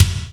JD800 Dance Card Drums

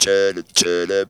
Funky Vocals; USB Soundscan vol.18; AKAI

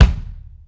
Bobo Kick, Kick One-Shots; KJ Sawka - Drum n Bass & Beyond; Drum Tool Box

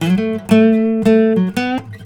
BARI LICK, Blues Guitar; ILIO; Fretworks; 120 BARI LICK

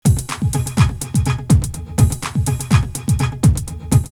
909 124, drumloops, DrumMachines; X-Static Goldmine; 90 s Sample CDs

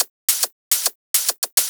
Shuffled Loops, 140 BPM

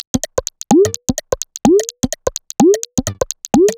127bpm, House Drumloops

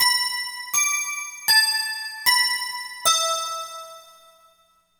Bell; Sonic Foundry (Sony Creative Software); Infinite Soul VOL-2; Bells

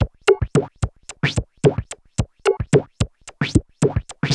Juno Filtered Korg MR-16 (110BPM), beats

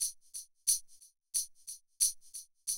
Classic DrumLoops

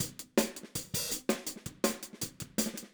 Hi-Hat, 162 BPM; Verse; Drums

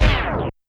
Scratch, YAMAHA RM1X

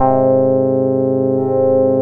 ARP; Rubber Chicken Software Sample Bank; OLD SYNTHS

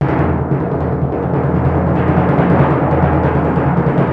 TIMPANI

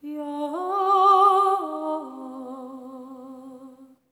SERENE, Symphony of Voices; Spectrasonics

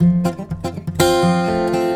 GUITAR 120; Best Service Advanced Media Trax Modern Composer

